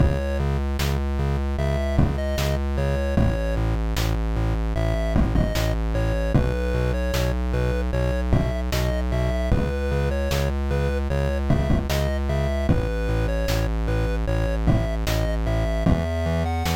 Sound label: Slow Feelings 8bit